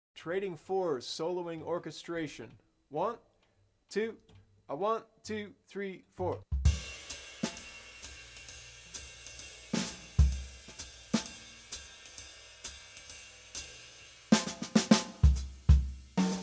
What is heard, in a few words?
Orchestrating Phrases, Bop Drumming; The Art Of Bop Drumming; Riley